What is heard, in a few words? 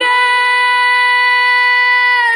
"evanes", Voice